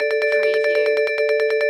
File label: Casino Slot Machine Spinning Wheels Loop